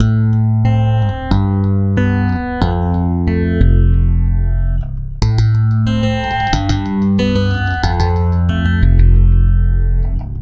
Stick Loop, Stick Bass

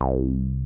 TB303; Zero G; Man Machine

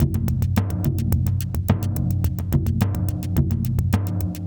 ELECTRIC ELEPHANT GROOVE; Sonic Foundry (Sony Creative Software); Vir Unis Infusion

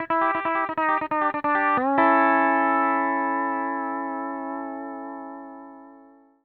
PD LOOPS 140, Whole Lotta Country

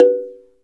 SLIT DR B 4, Heart of Africa; SLIT DRM1

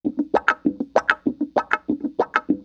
WAH PATTE, Funk Gtr & Wah; PlatinumPhattCD2; E-MU Producer Series Vol. 8 – Platinum Phatt

